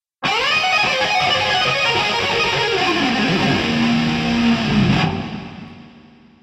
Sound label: lick; brutal legend